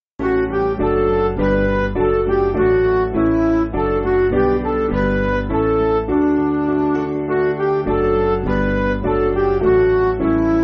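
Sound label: Midi, Music Backing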